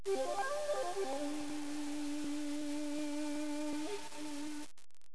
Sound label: PANFLUTE